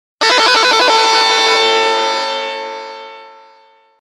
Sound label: Guitar Ring